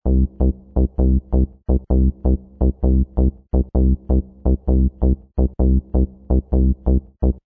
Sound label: house bass 130